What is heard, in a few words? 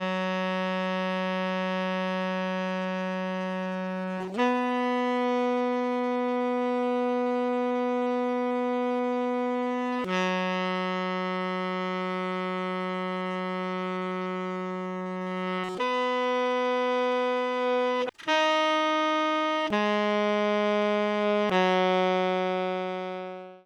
Saxophone